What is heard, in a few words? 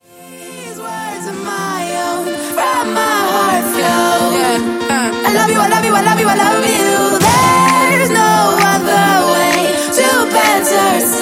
Future House; Remixfreakz